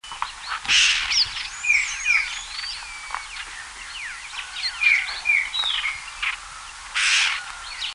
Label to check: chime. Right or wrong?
Wrong